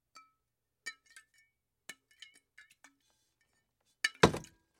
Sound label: Glass Water Jug; Pick Up Glass Water Jug With Sloshes. - Water Bottle